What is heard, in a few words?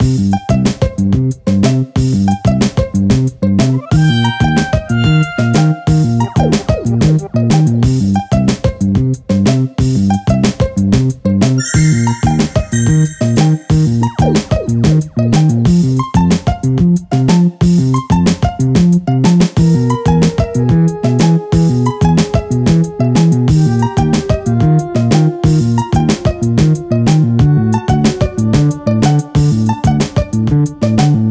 music